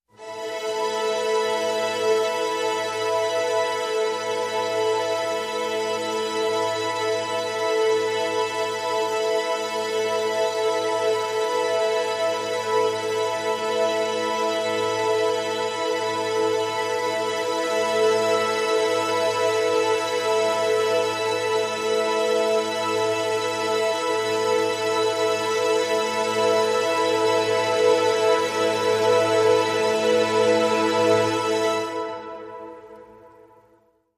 Collective Strings A

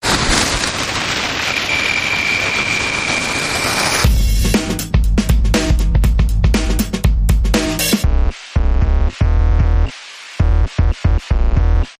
Trash Daze Dance Bumper

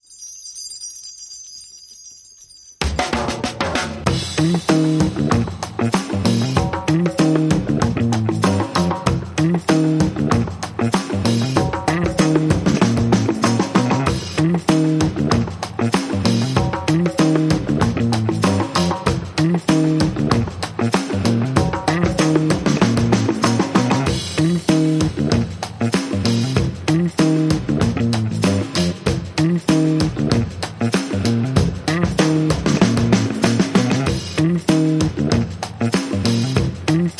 Clean Drums and Bass Mix

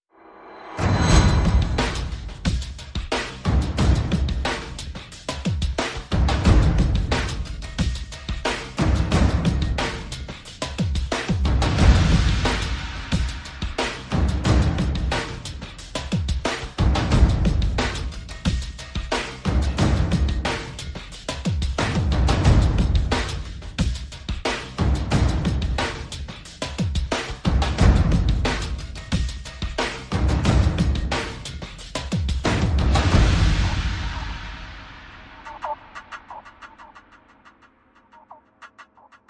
Perc Only

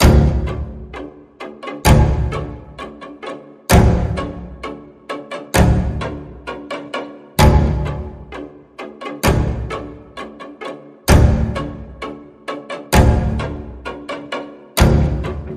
Sinister String Pulse D, Key of D

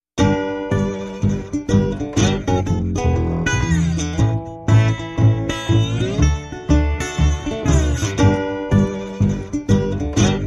No Percussion

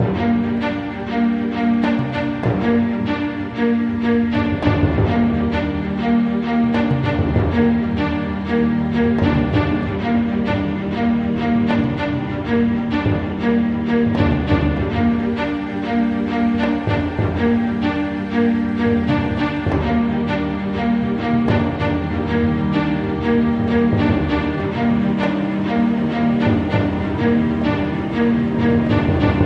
Orchestral Follower

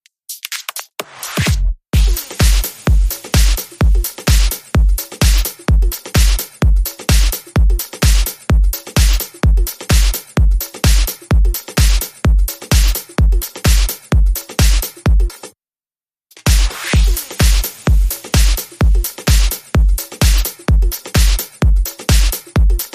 Beat

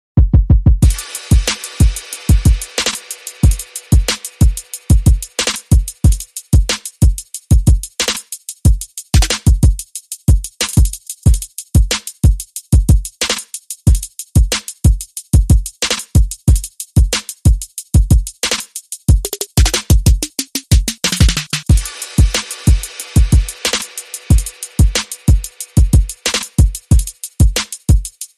Palletting Beat

